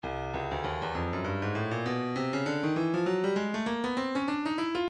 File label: stylus breakbeat midi 099 bpm playful